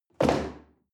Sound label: chair wood place hard